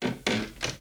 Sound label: run wood creaky